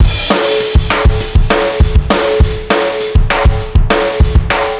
Fast; Ударные и барабаны (Рок, Поп); Rock & Pop

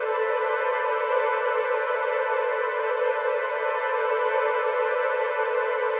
Flute trill; духовой инструмент флейта

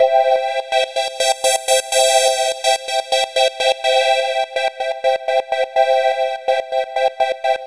Stutter, big beat

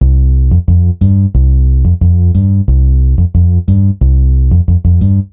Guitar Bass, лёгкая музыка; Бас сэмплы (Легкая музыка)

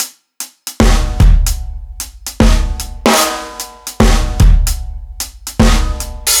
Rimshot Kit; Reggae (Регги)

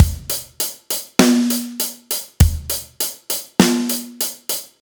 Drums, metal; Метал

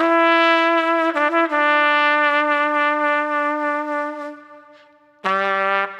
Trump Smooth, brass

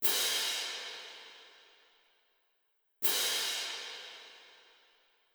Cymbals; Сэмплы ударных для фильмов: Cymbals A; Movie Score; 80BPM; Drums; film music

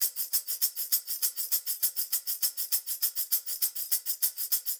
Tambourine D; перкуссия

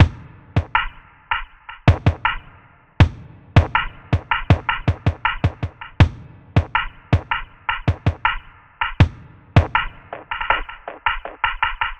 Cellular, ambient

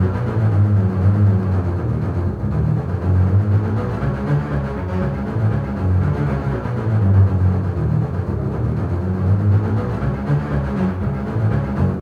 bass, playful waltz

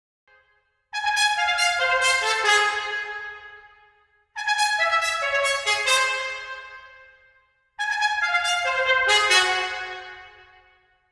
trumpet, напряжение

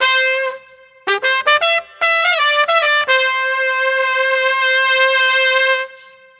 Trumpet, reggae; регги

